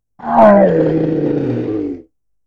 die, troll

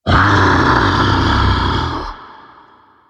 ogre

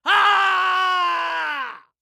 die sound

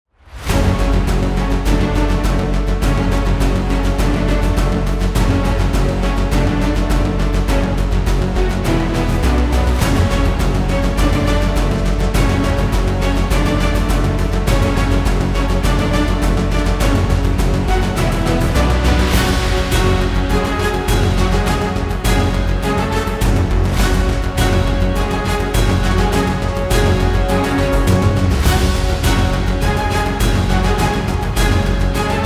combat

